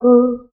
meooh, Voice; Notes

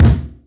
Kicks Loud

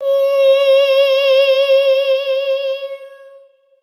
"eeeee", Voice

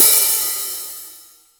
Cymbals

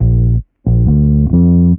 bass, Loops, Phonky

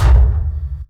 damper, Kicks Soft

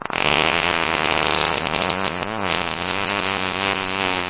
fart long

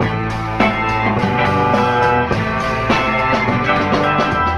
guitar keys rising slow, loops; Phonky

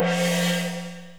gong

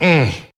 mmm

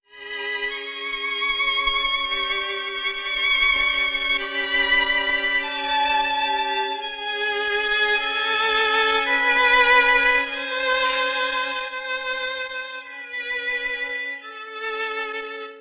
strings sad twenties drama; Loops

